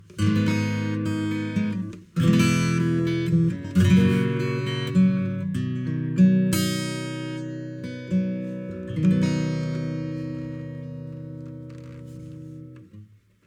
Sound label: FILTER Notch 2 pole CUTOFF 770Hz; Valves; REONANCE 60